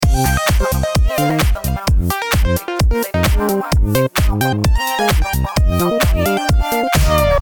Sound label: dance intro loop